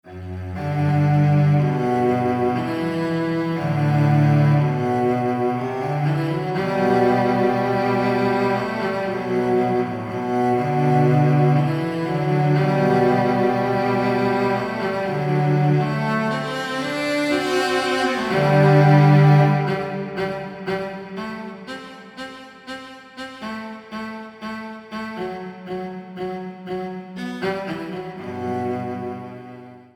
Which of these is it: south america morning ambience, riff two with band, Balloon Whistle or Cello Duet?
Cello Duet